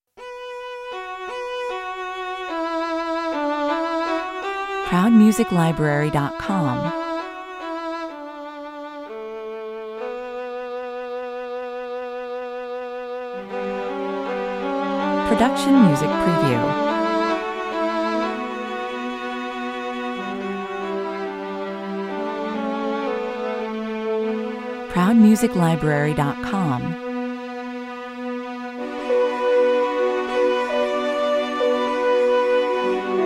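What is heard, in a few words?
celtic spirit orchestral 103 bpm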